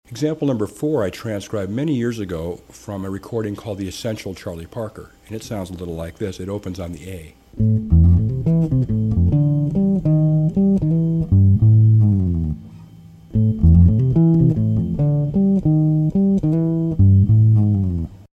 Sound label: bird bass lick